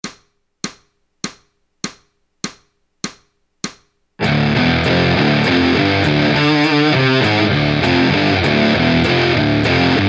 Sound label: riff easy, Guitare; Les plans de gratte